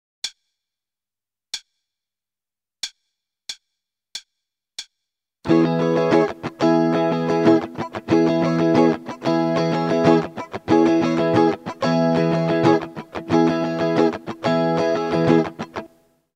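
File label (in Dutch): Ritme, gitaarstarter; Meespeel CD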